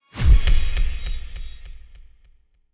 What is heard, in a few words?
win Sparkle Present Medium; reef raider